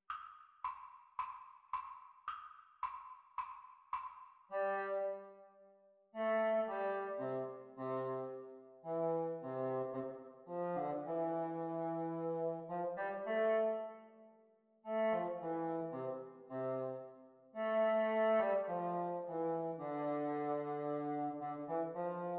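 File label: Bassoon Duets; Exam Speed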